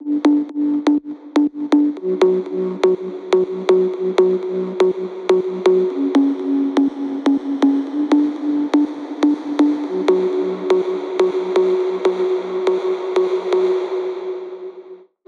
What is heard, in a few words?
House 122bpm Bass Reverb; Love My House Kit 3